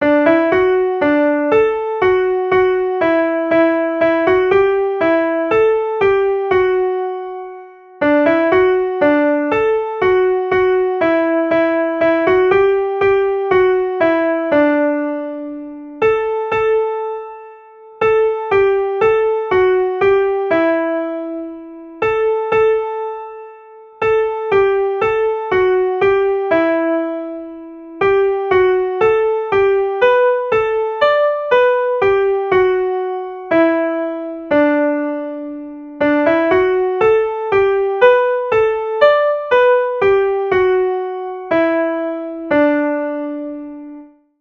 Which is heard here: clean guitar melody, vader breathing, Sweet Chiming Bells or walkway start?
Sweet Chiming Bells